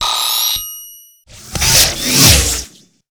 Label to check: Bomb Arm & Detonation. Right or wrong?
Right